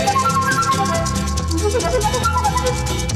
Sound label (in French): accords